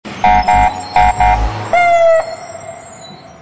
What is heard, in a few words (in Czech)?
sanitka